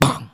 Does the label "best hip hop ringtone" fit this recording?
No